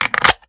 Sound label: sks reload empty mag in; Tacz1.1.5+; RSWS 1903